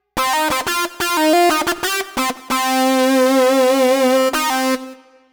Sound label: Lead Synth Lick; Dr. Fink's Funk Factory